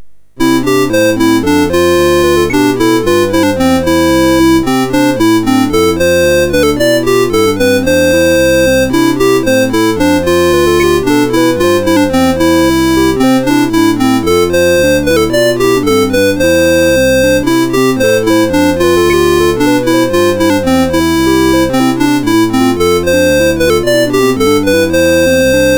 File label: Happy Birthday, MOS6581; Q-Link; MOS6581R2